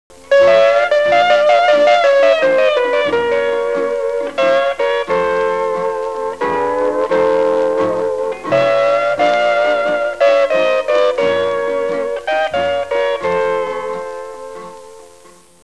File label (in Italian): suonerie musica hawaiian